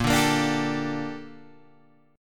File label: A sharp Major 13th, Guitar Open G